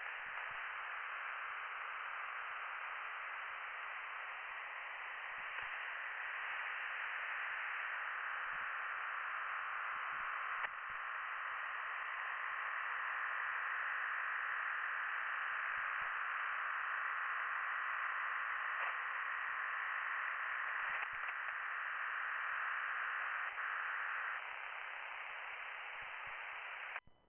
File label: from radio, 7177KHz